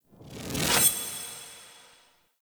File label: Hunter Sniper Shot Charge Ready